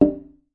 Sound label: African Wooden Drum Mid; ETHNIC and WORLD PERCUSSION